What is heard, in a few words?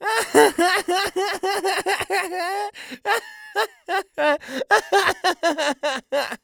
Human Male Laugh Boy Laugh Voice